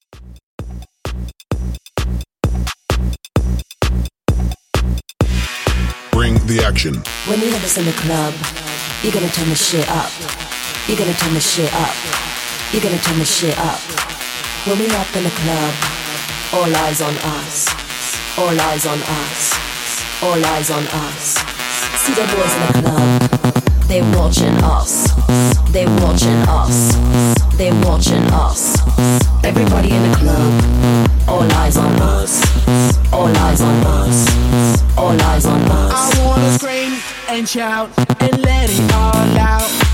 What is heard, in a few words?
Epic Mashup